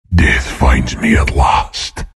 bearform death; Lone Druid

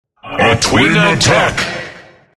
attack; jakiro

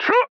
Grunt Jump; Viktor; Paladins

